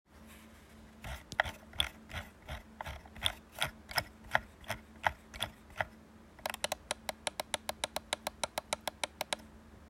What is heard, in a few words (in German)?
Mausrad